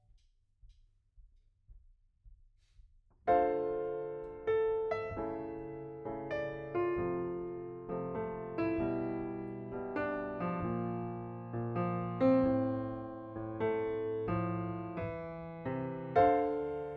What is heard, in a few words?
Piano B